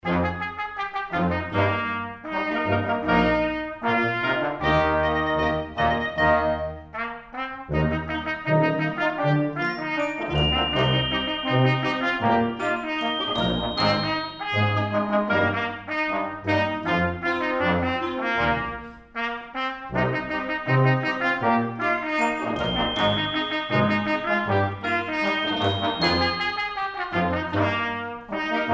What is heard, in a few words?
backing track